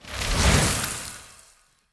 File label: Ice Spell, Magic Sounds; Fantasy Sounds Bundle